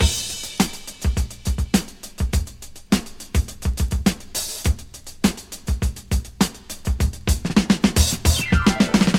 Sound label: 105 bpm modern breakbeat sample g sharp key